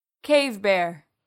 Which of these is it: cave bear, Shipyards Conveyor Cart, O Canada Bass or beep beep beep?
cave bear